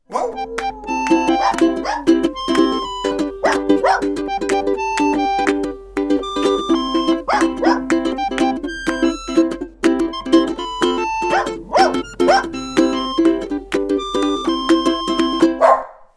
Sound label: Balloon Dog Sound